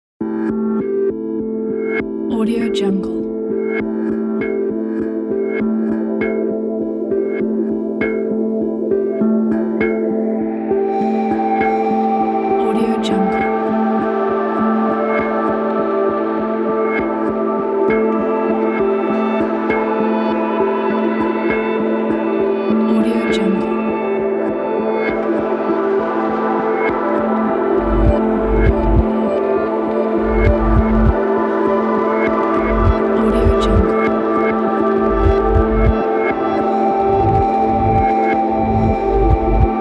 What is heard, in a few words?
atmospheric ambient reverse soundscape